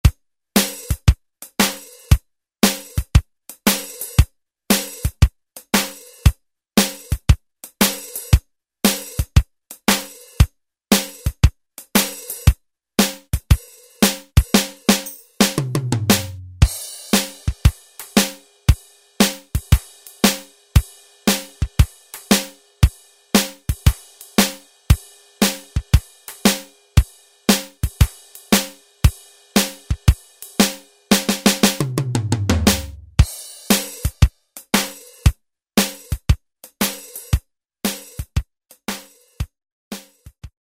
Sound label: Country, Alesis SR-16; Preset Patterns